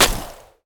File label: shoot frost